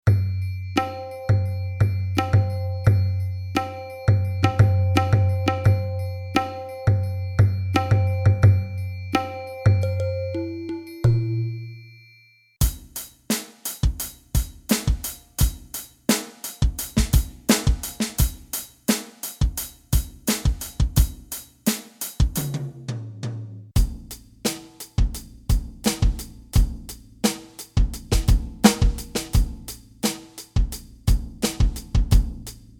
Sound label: Sample Rack Grooves